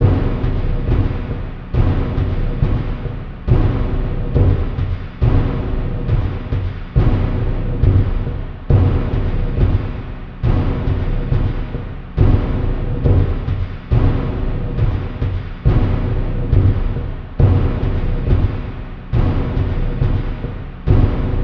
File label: Battle Drums; Real Warfare 2 Northern Crusades